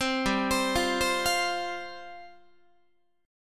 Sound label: G 7th Suspended 4th G down Guitar; Listen to G7sus4 arpeggiated; G7sus4 Chord